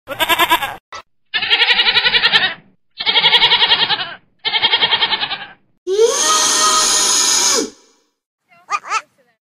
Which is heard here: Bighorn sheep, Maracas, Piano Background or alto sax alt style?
Bighorn sheep